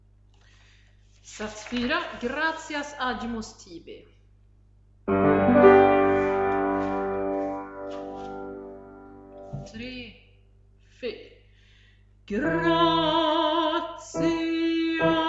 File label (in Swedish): Bas; Gloria; Gratias